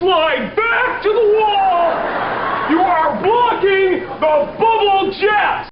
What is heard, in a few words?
bubblejet